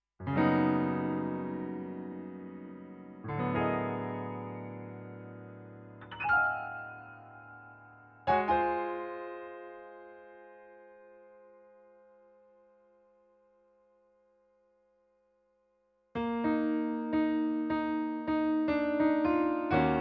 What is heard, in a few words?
live 3conv piano tst2